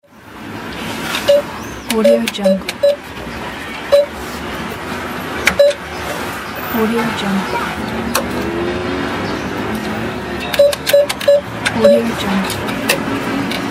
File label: atm sound in the street